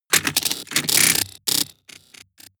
transformation rattle

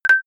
medieval notification popup; signale

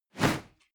whoosh classic; swooshes-swishes

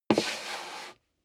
step leather shoes wood creak slide long; schritte

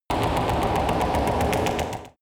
extrinsic buzz low, energie

